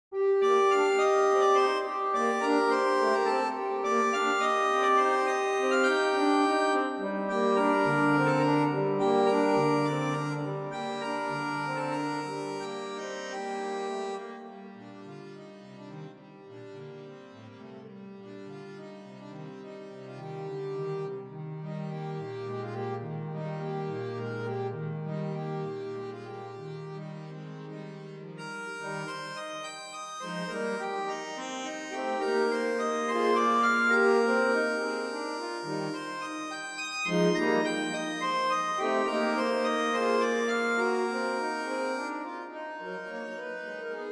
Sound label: Allegro vivo Gm, harmoniums; L Organiste; Franck